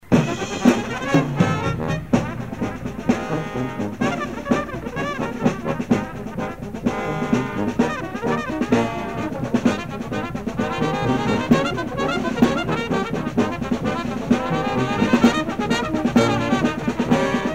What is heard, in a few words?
Mixed Animals Fire Jump Galop